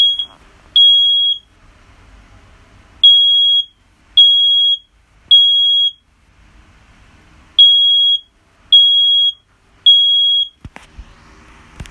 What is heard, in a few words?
Smoke Alarm